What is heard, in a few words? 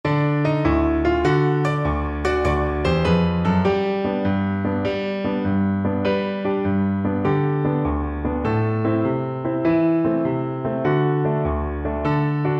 one potato, Viola